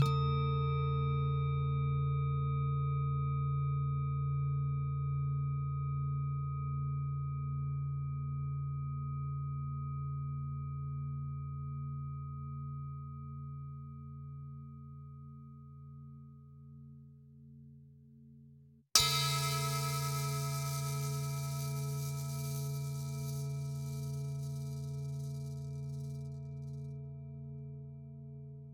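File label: ambience China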